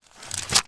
household telephone directory page turn

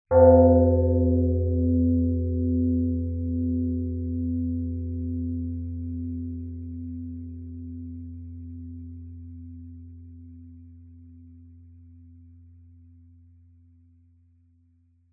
bell large strike mallet gong like

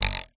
cartoon twang spring wooden ruler

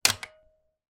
technology laptop dvd tray open

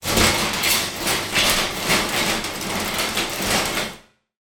industrial door metal heavy sliding warehouse; sound effects one

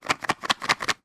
leisure card game in box shake

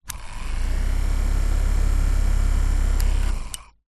household fan hand held plastic on run off short

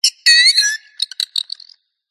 squeaky metal Designed Alien Bird; PMSFX

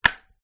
Light Switch

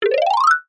cartoon ascend climb fast mallets

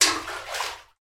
water splash flooded bunker; sound effects one

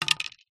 sport tennis racket set down concrete; zapsplat

